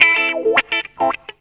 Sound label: GTR, GTR RIFFS, Funky Elements; Zero-G